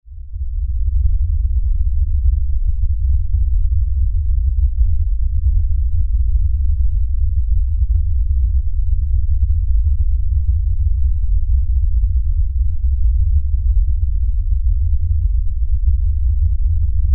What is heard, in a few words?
Impact Lfe Rumble; Impact Lfe Rumble Bass Low Long Constant; Low Impacts; Blastwave